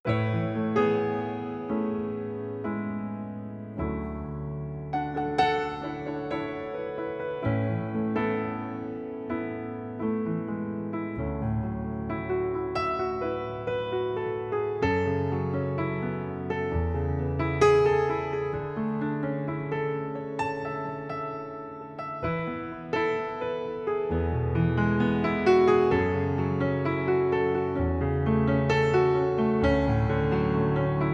Masterroom Super C Piano

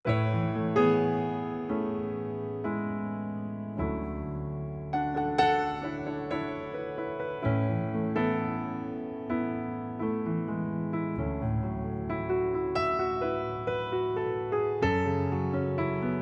EMT 140 Piano

